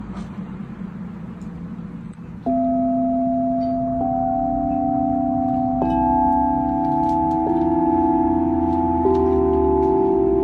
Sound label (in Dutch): 7 chakra alchemy schalen; 7 chakra alchemy klankschaal set